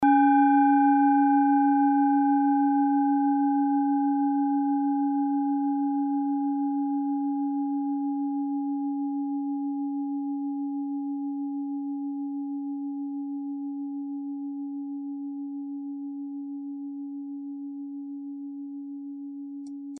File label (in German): klangschale ladakh; Die Klangschale kommt aus einer Schmiede